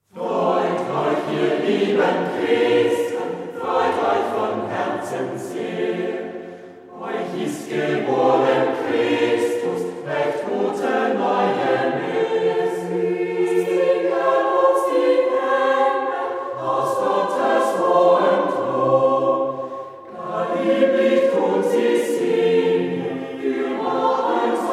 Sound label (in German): chor; Freut euch, ihr lieben Christen; anonymus (16. Jh.); Chor Haag